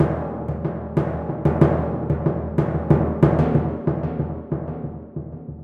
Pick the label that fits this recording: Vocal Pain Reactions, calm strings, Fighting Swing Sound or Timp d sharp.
Timp d sharp